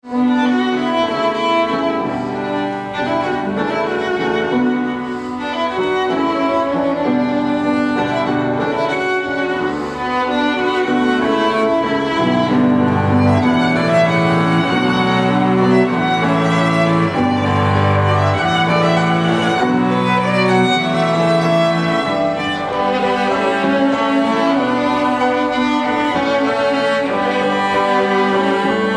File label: Cello Violin Piano